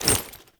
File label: Generic Foley; Warframe; Uriel